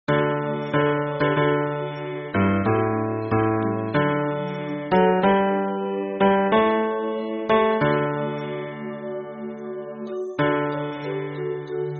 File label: hymn bass